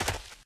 grass, player footsteps survivor walk

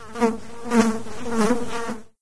fly buzz, random animals; ambient; Left 4 Dead 2; Source Engine

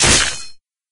cleaver hit; tf

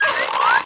springrider squeak; video games; player; taunt; source engine; tf

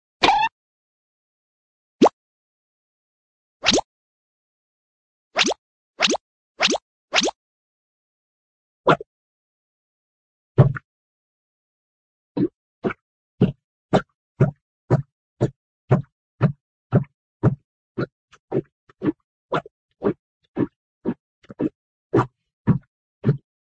plink, cartoon sour plink

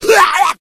smoker attack; voice; source engine; left 4 dead 2

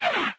travel restore down; windows 9x; sound schemes

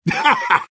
laugh short; sniper; source engine; tf

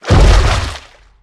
tank walk water, footsteps; player; source engine; left 4 dead 2

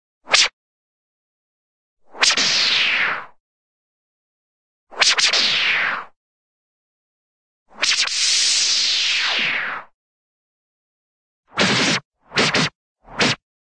sci fi whiplash laser, single, short; cartoon

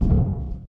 duct, footsteps, walk; player; source engine; left 4 dead 2; boomer

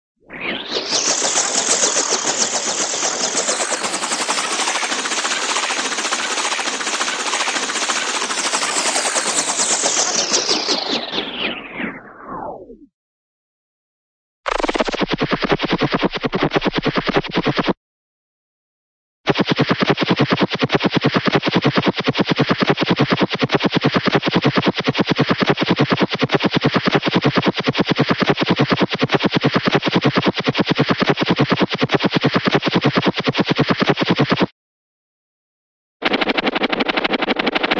helicopter, crazy copter start and stop; cartoon

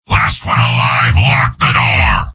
soldier battle cry; mvm

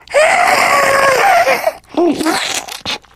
spitter lurk, voice idle

